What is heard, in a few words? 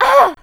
pain; player